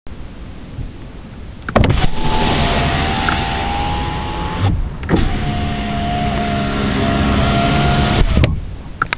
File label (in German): fensterheber, defekte; Sound- und Geräuschdatenbank